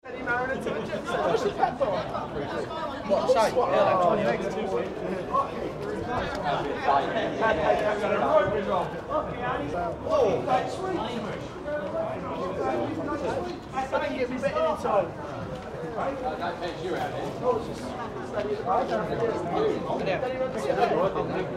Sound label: Greyhound Stadium Crowd, Under Grandstand - animated chat; Greyhound Stadium Crowd 2; Racing; Sports